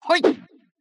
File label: slash; Mystical Ninja Starring Goemon; ebusimaru